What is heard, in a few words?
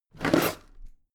packed drawer smooth open heavy halfway